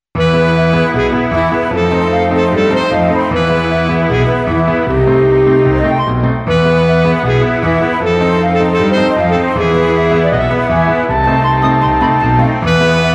CLARINETTE

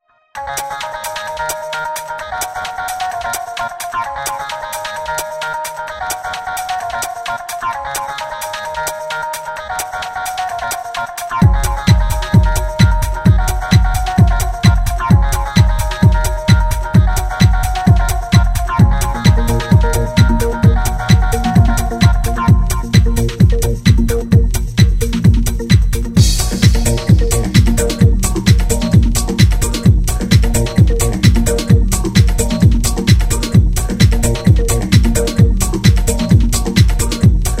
AMBIANCE SATIN

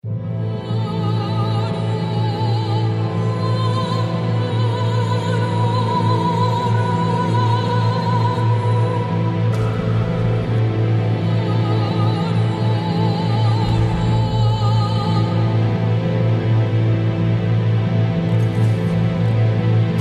série suspence final

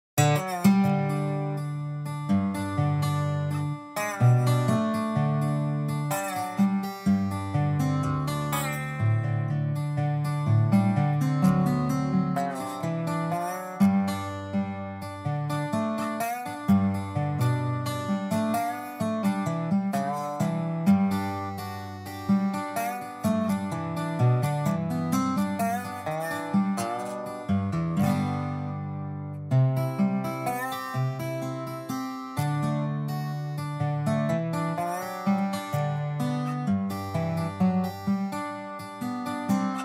COUNTRY DOBRO PACIFIQUE

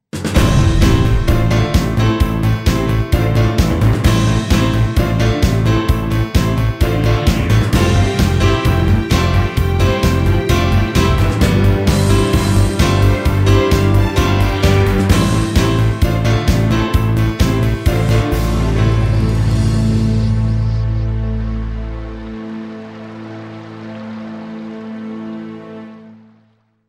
générique cent pour cent pêche

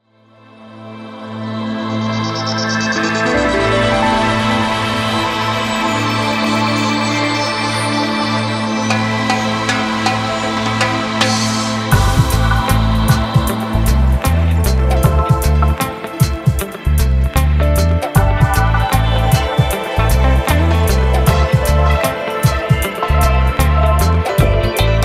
INTRO REGGAE

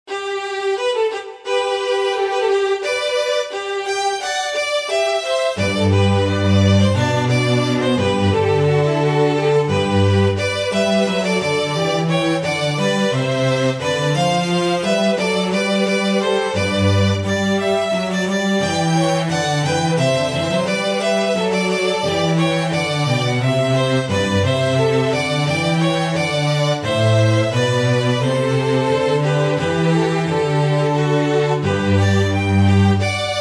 musique de salon

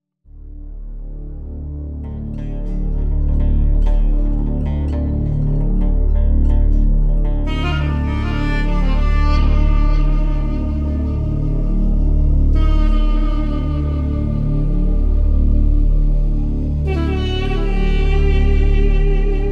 AMBIANCE OASIS

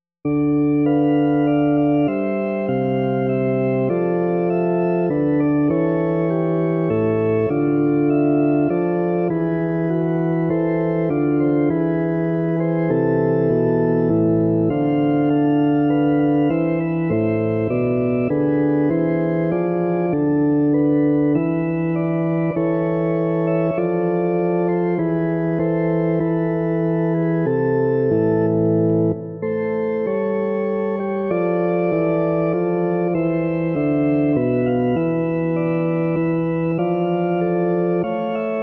sarabande cathédrale

